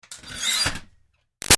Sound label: Ironing Board Metal Fold Out Screech; Miscellaneous Household; Big Room Sound